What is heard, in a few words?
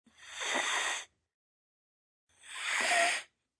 Cat Hiss, hissing (snake) (human voice); Cats; Cat Animal; VoiceBox